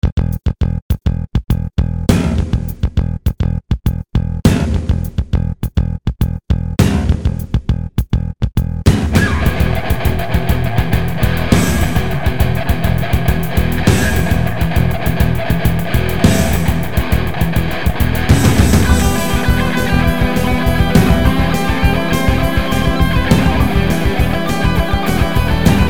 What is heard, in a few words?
Doom 2 MAP01 Guitar Solo